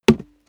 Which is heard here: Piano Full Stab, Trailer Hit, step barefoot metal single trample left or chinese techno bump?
step barefoot metal single trample left